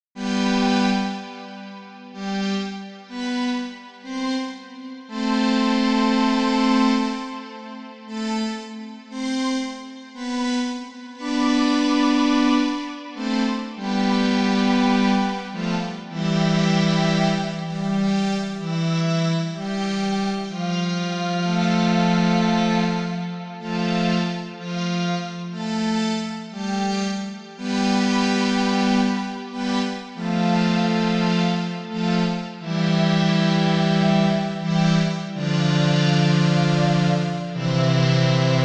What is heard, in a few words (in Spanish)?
ritmo